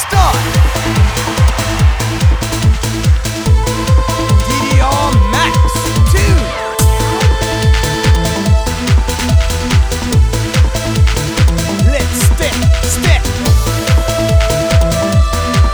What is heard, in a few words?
EURO TRANCE STYLE; Dance Dance Revolution 7th Mix; DDRMAX2